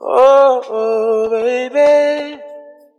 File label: "oh oh baby", sung